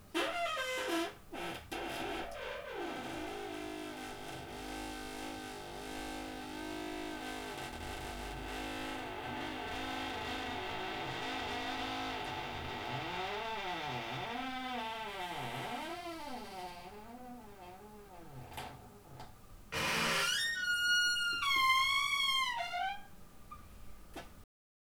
Porte qui grince; Bruitages

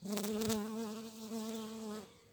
Abeille passe vol; Vols Bourdn abeilles

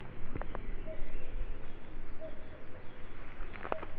Foret coucou 2x; Oiseaux

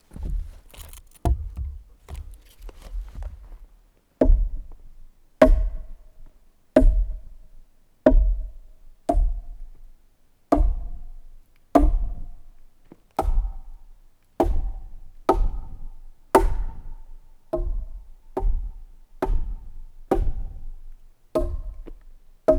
Gourdin Buches sac en toile NUIT; Percu Bois

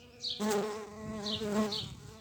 Abeille Osmie BzZzt; Vols Bourdn abeilles

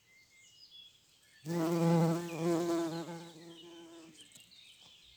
Bruitages Bourdon Envol; Vols Bourdn abeilles

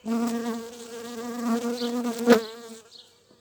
Abeille vol; Vols Bourdn abeilles

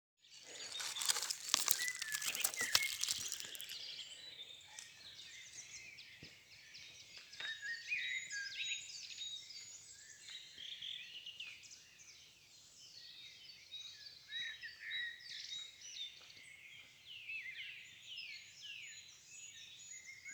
foret oiseau tel

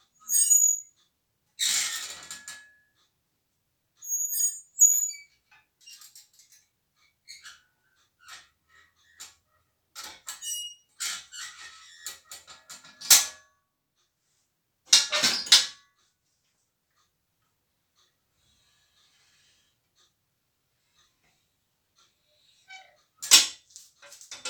Bruitages metal grince claque long

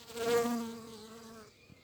Abeille Zzzz; Vols Bourdn abeilles

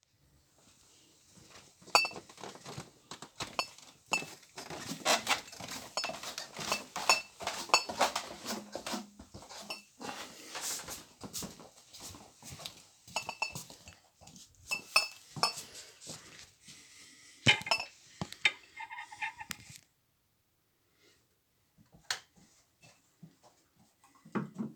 escalier lavabo

